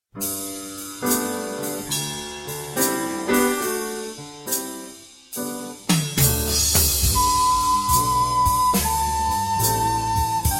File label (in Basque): Jazz Doinua lent